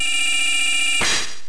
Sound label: panel change; MMBNMMOP